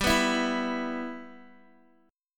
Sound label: G 6th, Guitar, Open D